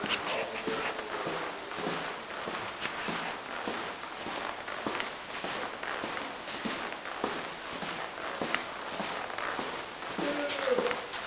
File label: Walking